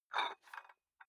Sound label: dumbbell turn nut out, fitnessstudio